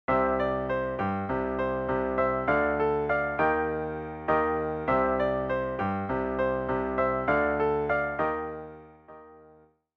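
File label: vamp, piano rendition of the chords, C Dm Eb; song intro; 3-Chord Vamps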